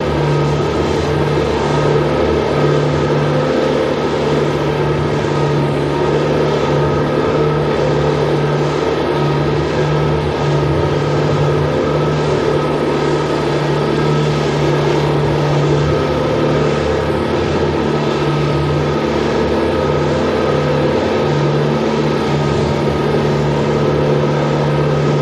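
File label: Motor Electric Heavy Duty, electrical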